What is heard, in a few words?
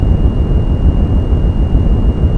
flight; tacscan